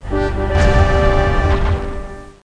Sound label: discord; Renaissance